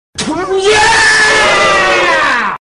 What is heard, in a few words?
yeahh; svencoop; FZSaySound